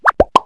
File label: whif pop; Wired for Sound Pro CD; Aristosoft, Inc.; pops